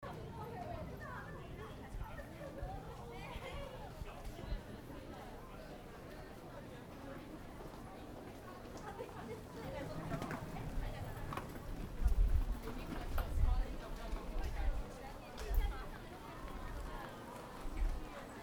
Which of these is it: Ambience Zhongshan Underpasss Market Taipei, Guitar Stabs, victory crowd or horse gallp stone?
Ambience Zhongshan Underpasss Market Taipei